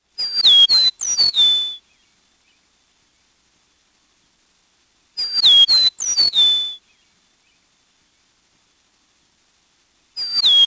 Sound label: eastern meadowlark